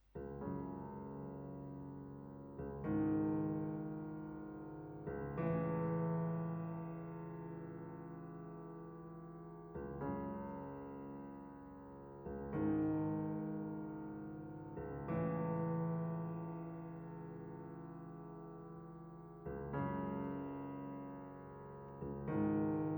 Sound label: Sonata for violin and piano